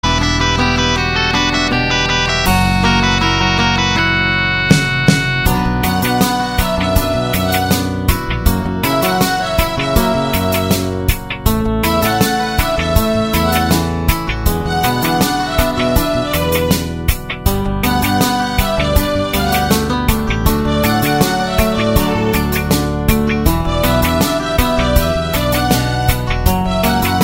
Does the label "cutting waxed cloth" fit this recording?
No